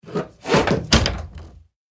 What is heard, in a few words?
Hanging Kick Awy Chai, crashes & creaks